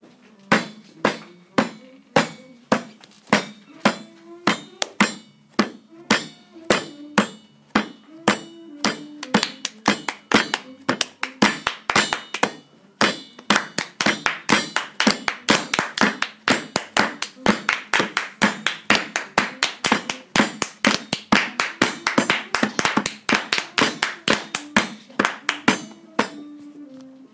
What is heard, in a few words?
clapping piece